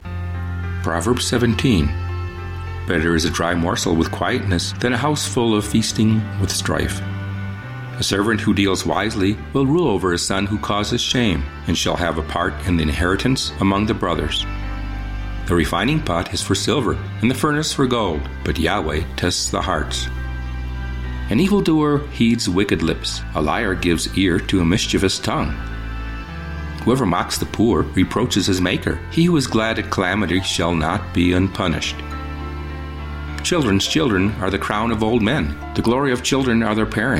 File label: gentle soundtrack newage oboe; Proverbs